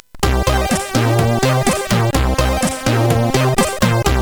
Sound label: Sound Test, CSG8580R5